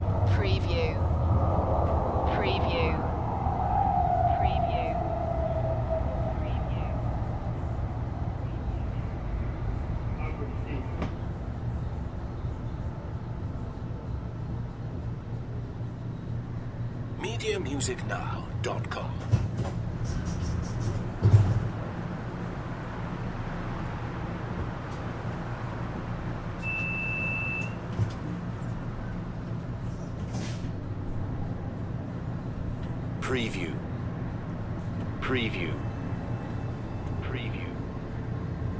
Subway Ambience, Electric Trains Arrive And Leave, Doors Open and Close